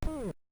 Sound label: Climb